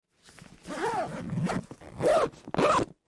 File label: Zipper Close; zippers